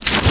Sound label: horn attack